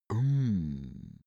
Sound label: cartoon male pity